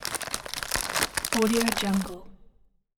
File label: snack bag